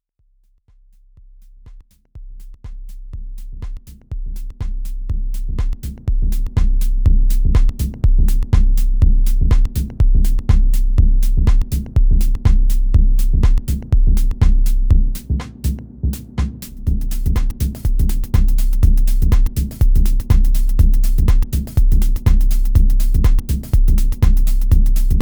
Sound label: MFB Tanzbär 2 glitchless